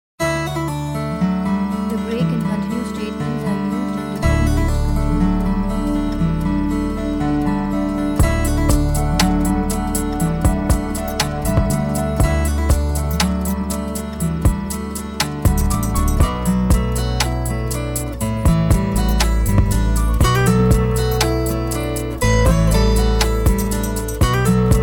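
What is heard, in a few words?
cool guitar; rock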